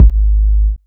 Kick

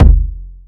Kick

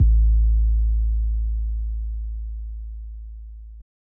Not Nice 808's

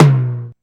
Tom, LinnDrum

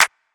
Claps

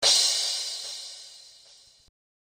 Crash, Cymbals

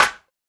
Snare

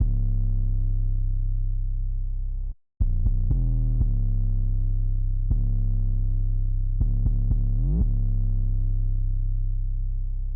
OG 808

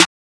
Snare Lo; Bape

